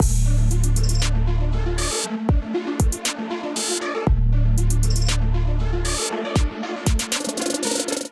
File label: Abyss Kit groove 59 bpm; MIDI Clips; Ableton; Factory Packs; Beat Tools